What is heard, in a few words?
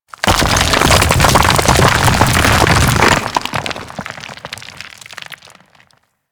Golem Melee Submerge; Warframe; Foley